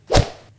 slap hit; gmod

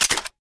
sm reload empty fast xmag magout; gmod

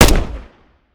fire; gmod; viper; weapons

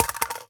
phys mag drop ak metal concrete, magazine drops

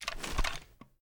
reload raise; gmod

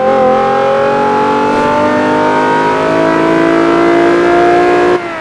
third, vehicles; 370z

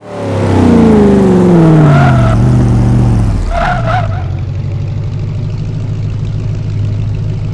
throttle off, vehicles; challenger70

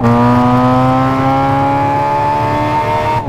third, vehicles; countach

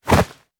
melee attack fist; viper

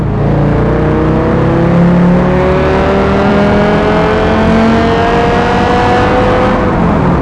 second, vehicles; gmod; tdmcars; pt cruiser

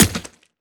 frag physics bounce mud hard; gmod; viper

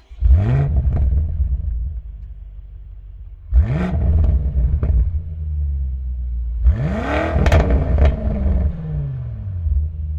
startup, vehicles; bmw m3 g80

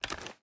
reload empty fast xmag start; gmod

